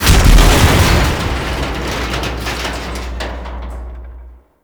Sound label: Pod Impact; Missions